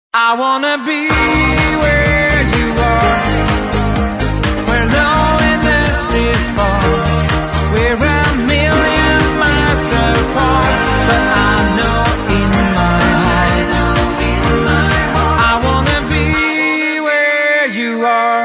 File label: english song tone ringtone